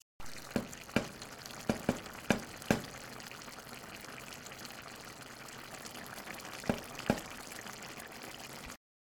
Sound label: Pot Boiling